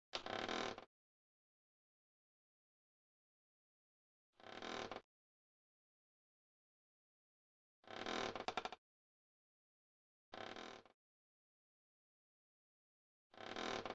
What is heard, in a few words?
ship wood creaking